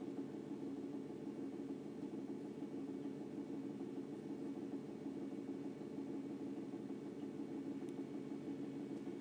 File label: daewoo nofrost multiflow fridge